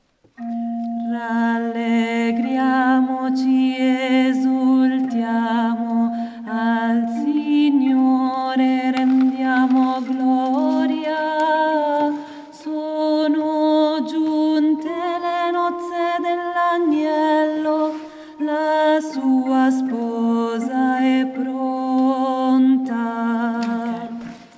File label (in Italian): Rallegriamoci contralti; ARCIDIOCESI DI SANT’ANGELO DEI LOMBARDI-CONZA-NUSCO-BISACCIA